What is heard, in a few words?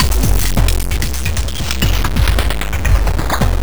BRITE FX, Industrial Loops; 132 BRITE